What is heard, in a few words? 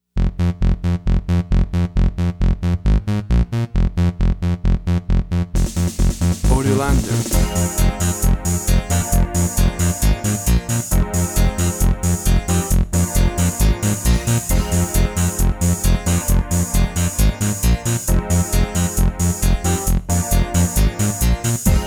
electronic vamp